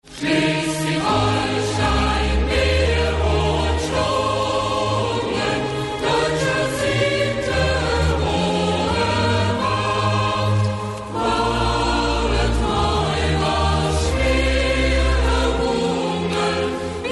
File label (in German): Lied; Schleswig-Holstein